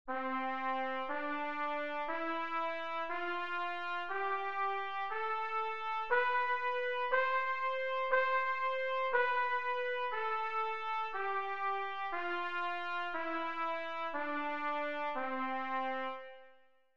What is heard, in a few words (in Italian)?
Tromba